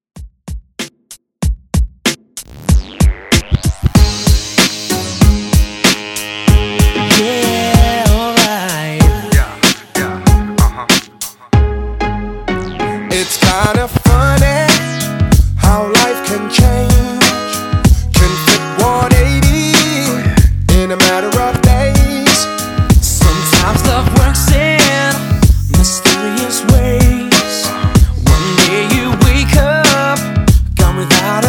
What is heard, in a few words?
2000s pop redrum